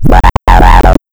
Sound FX Acid Scratch; Alienistcog

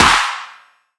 Clap; pitchglitch

